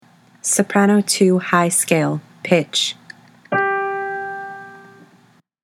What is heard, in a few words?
soprano scales high; all-state choir